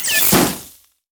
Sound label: turret; LoL; heimerdinger